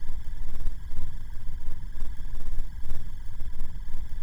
Processed Noise; layering

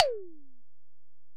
Tom, Alesis DM-PRO, Drum Machines, Toms

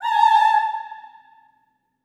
Staccato F A5 R, Symphony of Voices; Spectrasonics

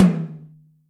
TOM Xfad H, Acoustic Tom; Yamaha DTXpress

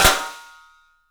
PICSNF, 3D Drums, PICOLO FLAM; 3D Sound

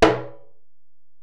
Tom, Alesis DM-PRO

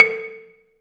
BELA2 A3, BELAFON, Asian Traditions; USB Soundscan vol.60; AKAI

